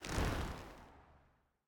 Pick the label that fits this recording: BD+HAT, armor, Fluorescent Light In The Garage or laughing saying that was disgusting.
armor